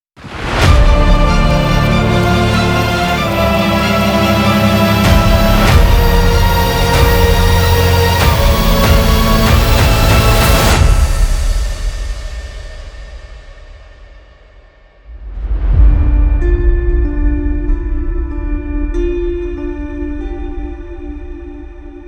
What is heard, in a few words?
trailer hybrid